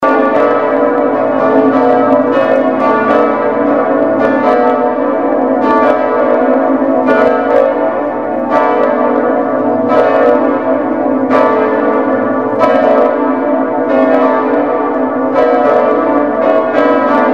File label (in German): Glocken